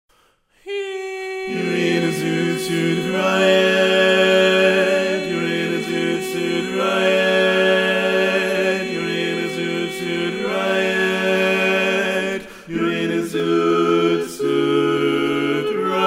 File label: Tenor, barbershop